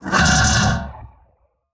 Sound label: Transition Growls Vocal; Production Element